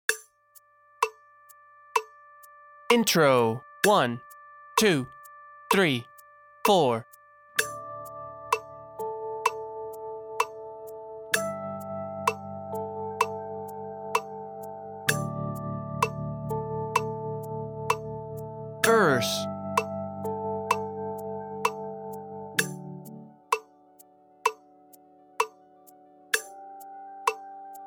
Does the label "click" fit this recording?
Yes